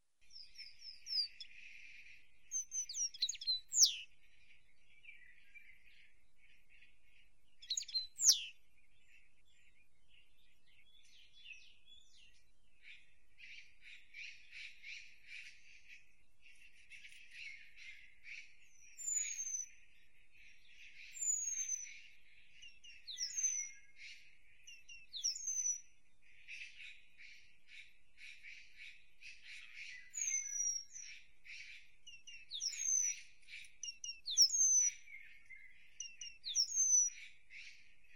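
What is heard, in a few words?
obyknovennyy remez golos, penduline tit voice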